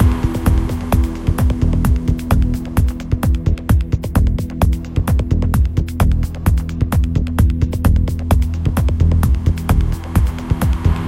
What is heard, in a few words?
recreation; Mirrors Edge